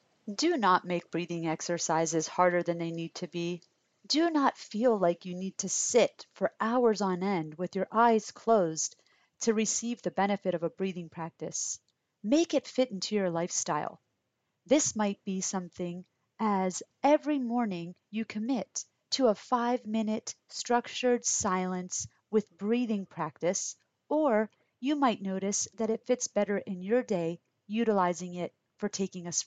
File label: Breathing